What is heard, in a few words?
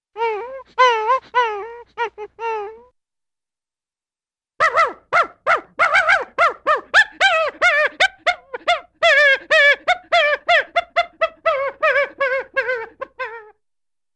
Funny Dog Sounds, Cartoon; Hollywood Edge; Cartoon Trax